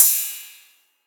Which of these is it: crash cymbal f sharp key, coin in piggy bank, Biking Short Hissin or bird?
crash cymbal f sharp key